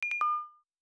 Failure Haptic; i OS; iOS10; nano

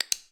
item activate; VoiceView

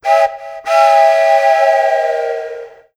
Choo Choo, iPod sounds; i OS

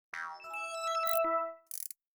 Windows Shutdown

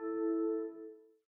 Windows Notify Email

